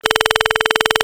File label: perc flaps